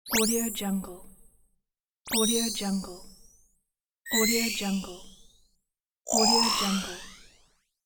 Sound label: anime power up; sick-audio